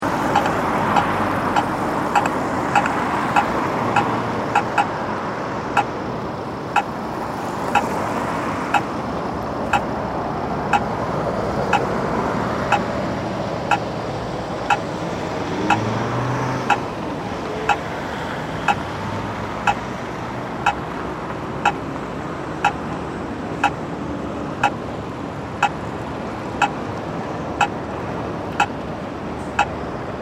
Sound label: Traffic Crossing, Close recording of Swedish traffic crossing.; Two different types of crossing signals. Heavy traffic passing by in background.; Traffic And Car Sound Effects